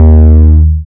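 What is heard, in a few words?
808 D sharp high, drift phonk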